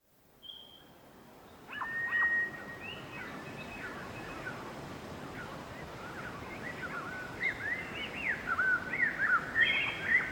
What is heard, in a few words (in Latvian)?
lauku skanas